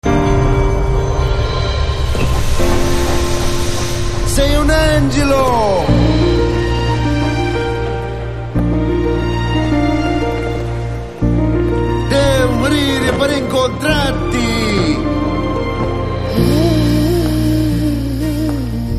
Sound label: Telugu Ringtone; Radhe Shyam